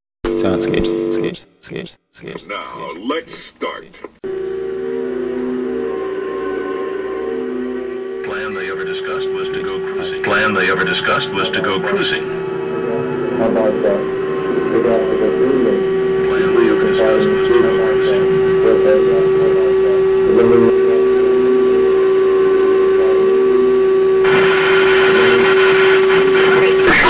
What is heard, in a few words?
Computer Breakdown, soundscapes